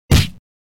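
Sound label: kick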